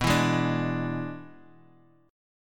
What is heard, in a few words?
E 7th B, guitar